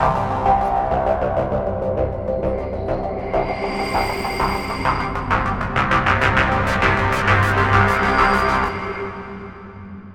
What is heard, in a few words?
Signature Sound; FXHome; Signature Tracks